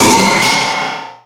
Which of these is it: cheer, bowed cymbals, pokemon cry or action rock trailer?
pokemon cry